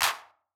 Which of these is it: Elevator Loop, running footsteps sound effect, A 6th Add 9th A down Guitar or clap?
clap